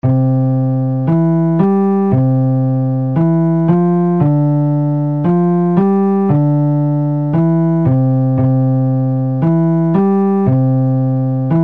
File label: La Vielha Basse